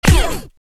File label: break